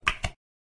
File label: button click on; ion sound